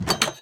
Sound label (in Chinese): 铁门 打开声